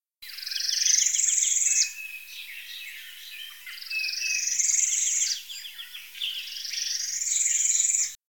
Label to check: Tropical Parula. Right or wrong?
Right